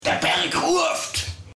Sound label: Climb